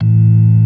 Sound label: ORG. A1; B3 FULL